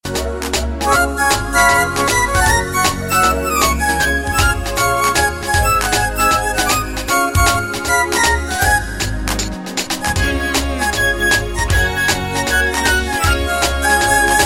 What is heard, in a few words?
Jazz Brush